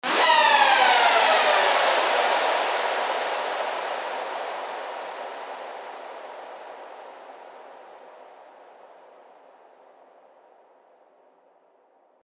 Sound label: Downlifter; SERUM FX